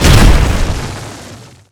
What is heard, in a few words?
DROP Large